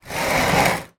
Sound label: foley glass jar scrape on metal; zapsplat